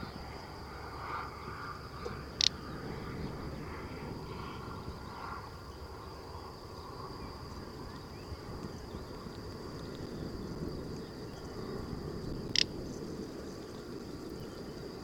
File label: Little-bustard, پرنده زنگوله بال; صدای پرنده زنگوله بال